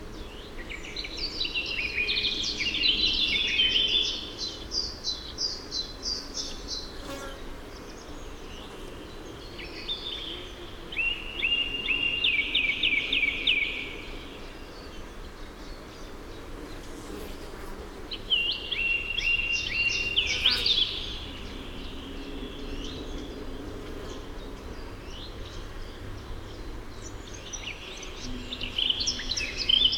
Moenchsgrasmuecke; Neuried M; Michle Peron

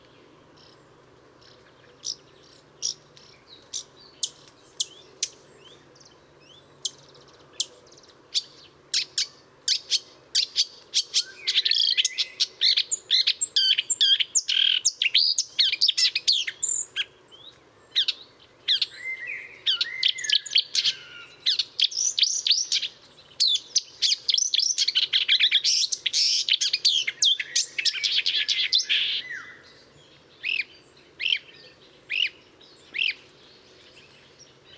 Sumpfrohrsaenger; Murnauer Moos; Michle Peron

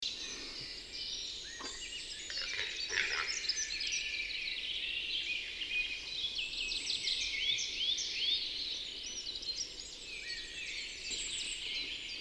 Auerhuhn; Bayerischer Wald; Susanne Rieck

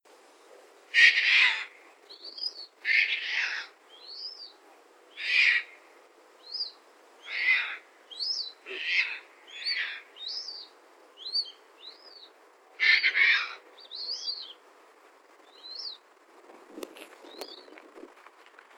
Raubseeschwalbe; Rothsee RH; Matthias Bull

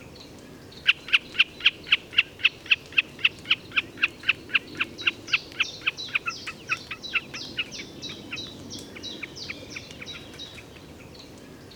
Bekassine; Murnauer Moos; Michle Peron

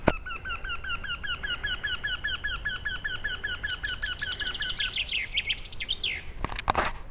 Wendehals; Murnau GAP; Christian Haass

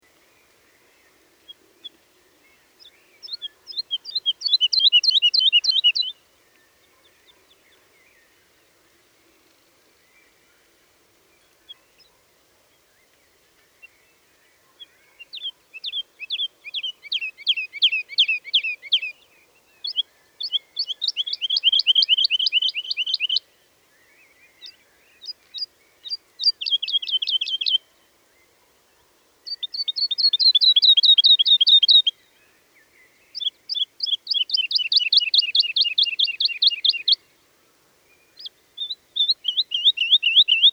Heidelerche; Dittenheim; Michle Pron

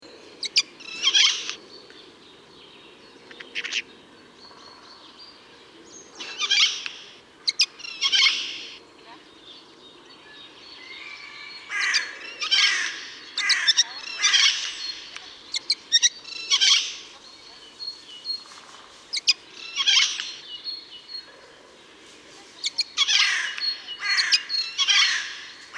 Eichelhaeher; Landshut LL; Michle Pron

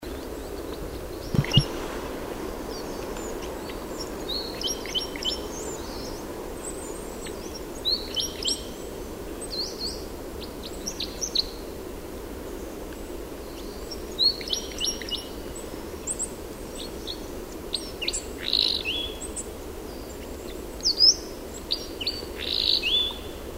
Fichtenkreuzschnabel; Ruselgebiet; Susanne Rieck

